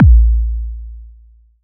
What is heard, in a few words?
Kick